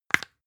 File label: spice jar open